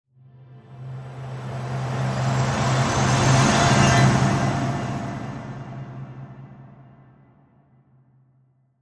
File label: Holiday Magic Whoosh